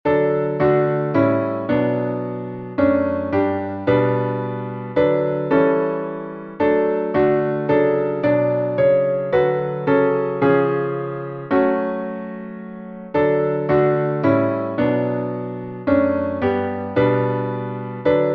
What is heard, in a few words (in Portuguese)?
salmo instrumental